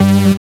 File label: SYN, DANCE SYNTH